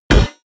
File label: hit metal hammer large